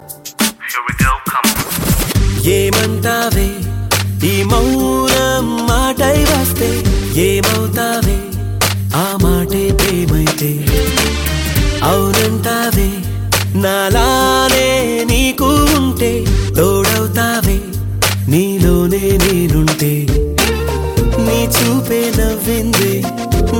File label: Love Song